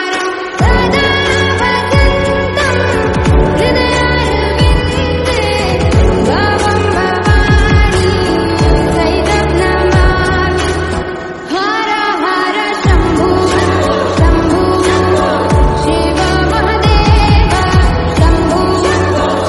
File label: Shiv Bhajan Bhakti Devotional